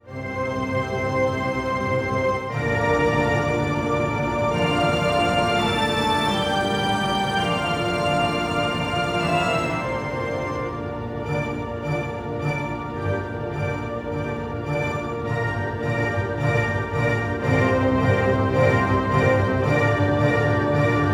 Orchester Spannungsmusik

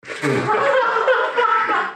Prusten Lachen Kurz

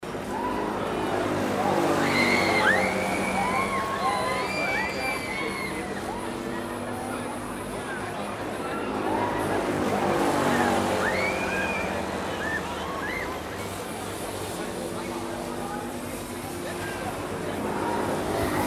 Rummel Menschen Schreie Grosses Pendel

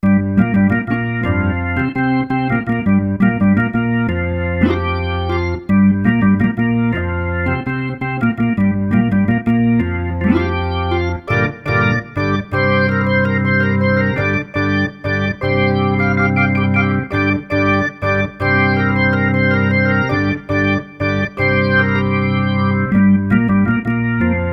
Hippie Beatnix Orgel Ohne Hall

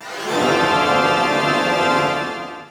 Orchester Jingle Tusch B

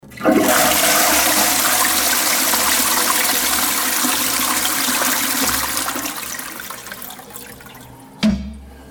WC Spuelung

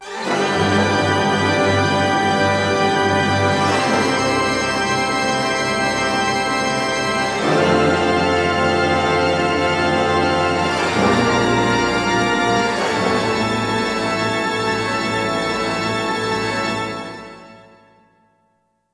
Orchester Auftakt Jingle D

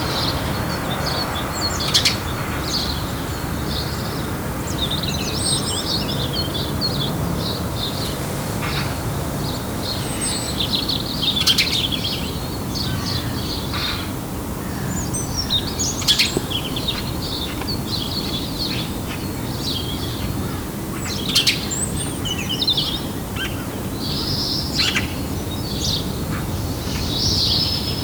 vogelgezwitzscher autos

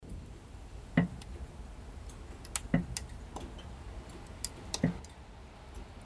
Kaminofen Feuer Ausdehnung; Feuer & Grill

